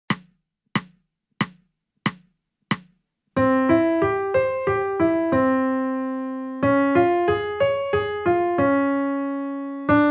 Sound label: major triad arpeggios 92 bpm C4